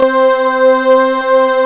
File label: HOT FUZZ, SYNTHS; Pro-Rec - Synthsonic